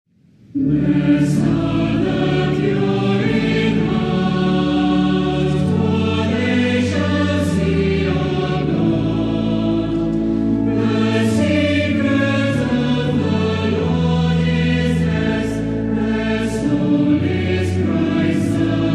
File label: choir